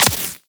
Laser Impact Flesh; Warframe; Bullet Impacts

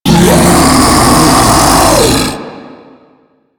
Infested Ancient Taunt Scream; Enemies; Warframe

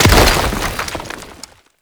Destructible Rock Cover; Warframe

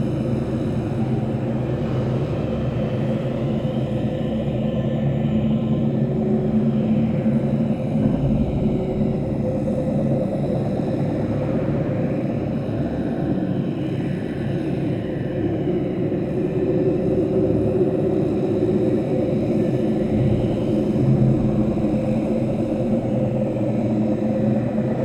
Gas Interior Corridor Amb; Warframe; Corpus

